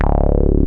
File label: wow bass; Dance; BASS SOUNDS; Masterbits; Dance Trax